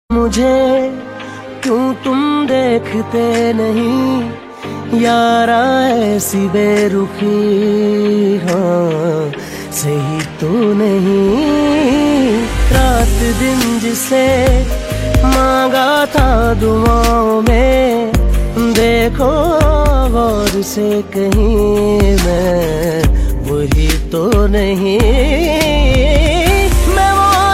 New Version Romantic Hindi Ringtone

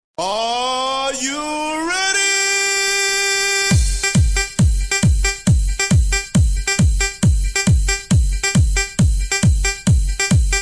instrumental

